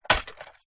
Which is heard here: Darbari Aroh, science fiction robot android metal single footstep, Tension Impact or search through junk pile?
science fiction robot android metal single footstep